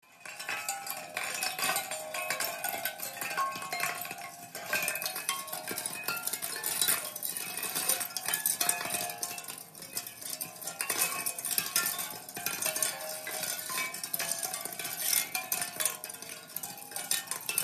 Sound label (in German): Muschel-Mobile Spirale